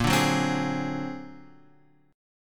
A sharp Augmented 9th A sharp Guitar Open F; A#+9 strummed; JGuitar

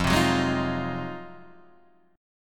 F Minor 7th Flat 9th; Fm7-9 Chord {1 3 4 1 4 1} strummed

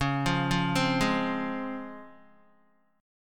C sharp Major 7th C sharp Guitar; C#M7 arpeggiated; JGuitar

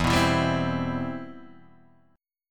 E Minor Add Flat 13th; maddb13:1,b3,(5),b13; Emaddb13 Chord {0 2 2 0 1 0} strummed

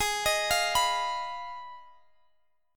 F Minor 7th Flat 5th, A flat

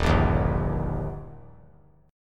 Eb Major, down; strummed; 3C,3F,3A,3D

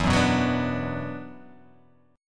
E 7th Suspended 2nd E down, guitar

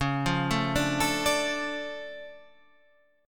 D Minor Db, Dm/Db chord, guitar; Dm/Db arpeggiated; JGuitar

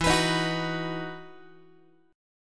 E Major 7th Suspended 2nd, Guitar

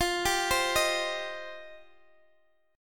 F 7th Suspended 2nd F down Guitar Open D

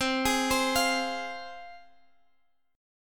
C Suspended 4th Sharp 5th, C, chord; arpeggiated; Csus4#5; x,x,10,13,13,13

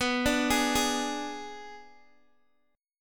B Minor 6th B, Guitar DADGAD

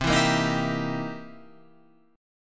C 7th Sharp 9th, C, down, Guitar, Open F; C7#9; strummed

